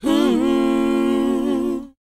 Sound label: WHOA G#, Vocal R&B & Dance; ILIO; Vocal Planet